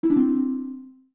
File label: Dialogue Done Mono; zelda; OOT